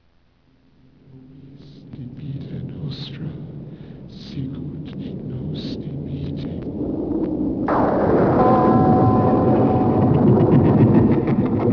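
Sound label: Chapel, Spooky Sounds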